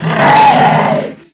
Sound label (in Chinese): boss4死亡声音; Wild West Shootout (Arcade) (gamerip) (2019)